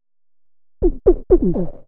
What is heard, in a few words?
HOUSE FX 125